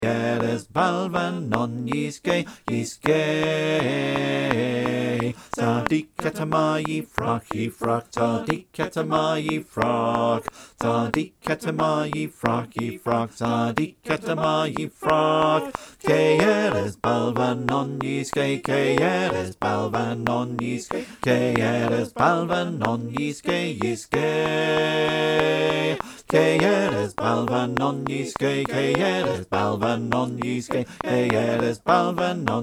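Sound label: Bass clicktrack, choir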